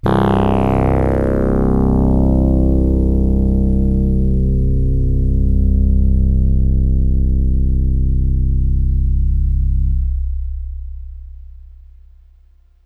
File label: BASS Big evil FM bass